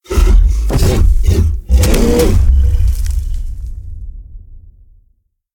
Gorilla sound

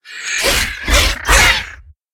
Gallimimus Roar

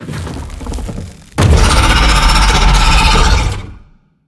Spider sound

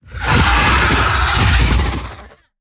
Scorpion sound

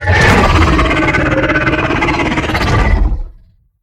Reaper sound

